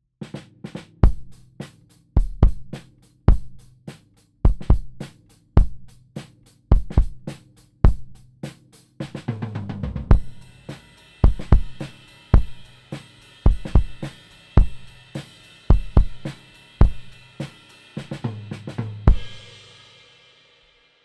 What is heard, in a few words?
Kick Out Full Set; Oktava117